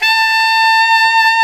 SAX ALTO MP, Tube; Roland LCDP07 Super Sax

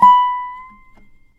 SLIDE, Dobro Slides

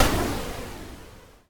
noise fx; Masterbits; Switch FX

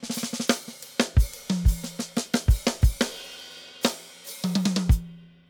Randomness, drums; Basement drums vol 1

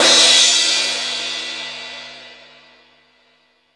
DRMSND, Underground Hip Hop, BEATKIT; USB Soundscan vol.02; AKAI

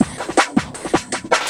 Loop, Drum & Bass, 160 BPM

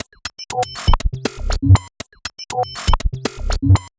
Buzzer, Percussion, 120 BPM; Sonic Foundry (Sony Creative Software); Underground UK House & Electro

